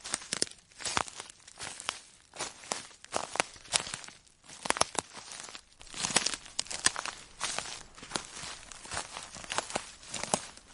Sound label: grass, footsteps